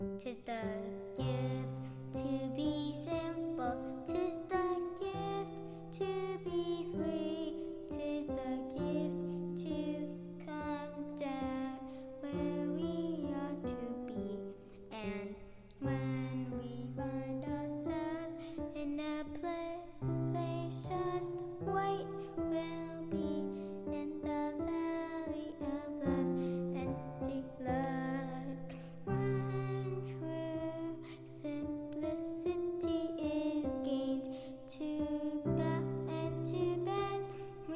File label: Simple Gifts Vocals, student recordings